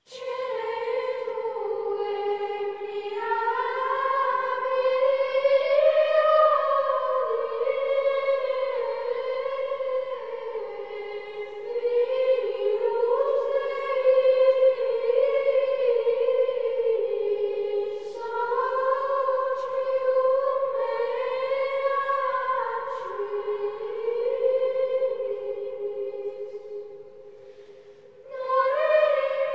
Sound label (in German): Ambrosianischer Choral, Gregorian chant; Missarum; Post Evangelium; Coenae tuae